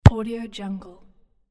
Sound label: single soccer kick